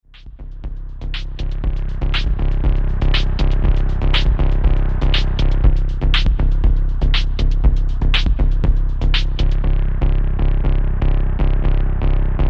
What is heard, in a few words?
Breaks Mix; Tech House - Electro House